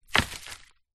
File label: large stick drop on ground; foley; zapsplat